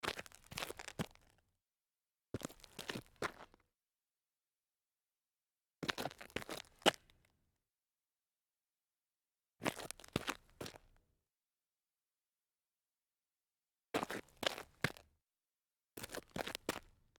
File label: dress shoe turn gravel